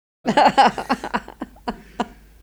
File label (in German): Lachen